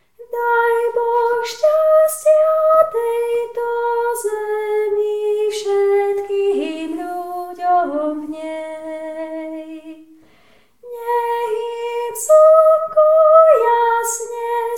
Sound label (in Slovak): dajbohstastia sopran; spevokol